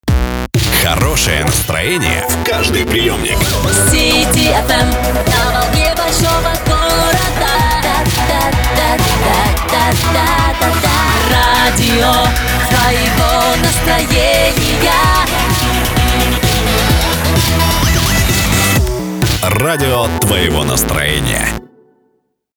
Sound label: radiojingle; ru-cityfm